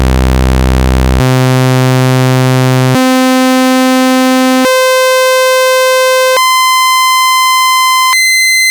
vco ramp wave, analog synth; analog synth 101

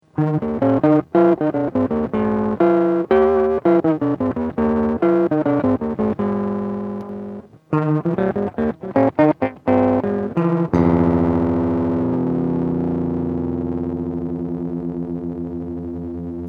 Ring Moddish, guitar synth

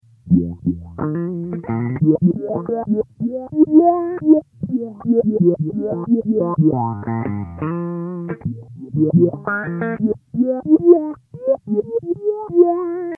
Auto Wah, guitar synth

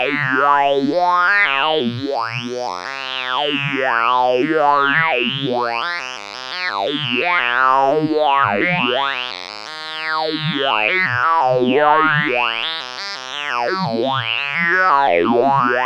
VCA fixed frequencies dry then wet knob twiddle; QUAD TIMBRE BANK

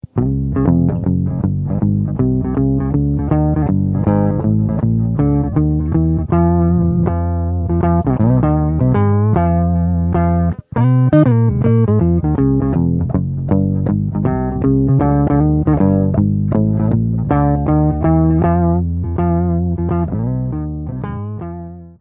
Orig And Sub Octave, guitar synth

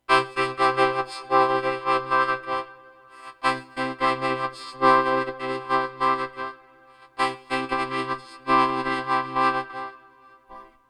harmonica, vocoder; analog synth

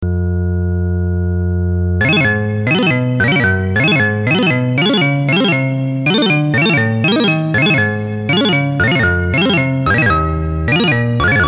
sequencer ex start; ten step sequencer; analog synth

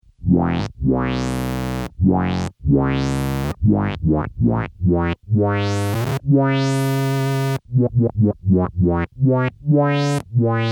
Synthy Stuff; guitar synth

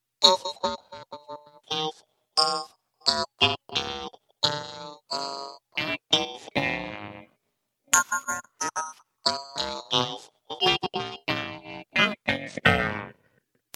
Voice X Glock; analog synth; ring modulator

